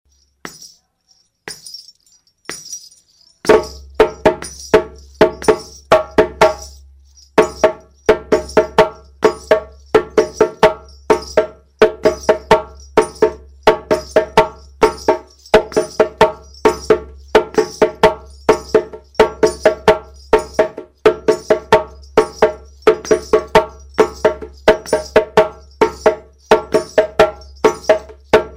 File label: Kuku, djembe